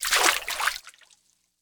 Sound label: Water Walk